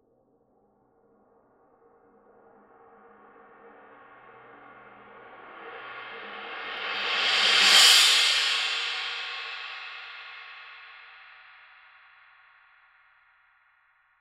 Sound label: pst x 20 swiss medium crash roll; paiste